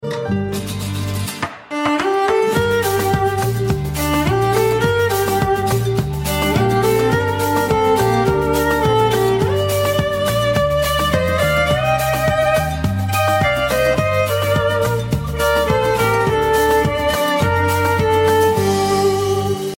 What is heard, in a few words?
bella ciao guitar ringtone; nhac chuong